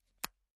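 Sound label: cell close, beeps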